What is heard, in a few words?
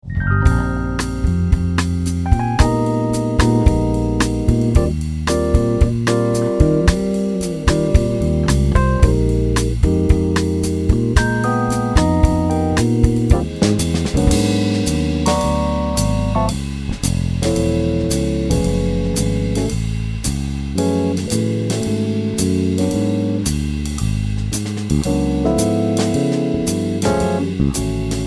Sax School Backing Tracks